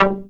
THE DARK C5, bass; Q Up Arts; Dream Experience

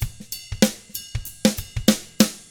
95 funk, drums; ILIO; Double Platinum Drums 1

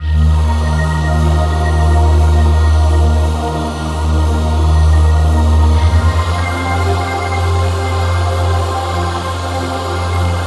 Pad, Melodic Synth; Drum & Bass

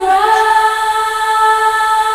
mixed woah, Vox FX; E-MU Formula 4000 Series Vol. 1 - Hip Hop Nation

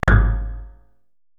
prc c5, Procussion Mix; Retro Sampler; EMU E-III

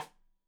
Side Stick Stereo; Deeply Sampled Drums; SMDrum

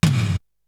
Tom, Drums; ZERO G - Datafile DISC 3

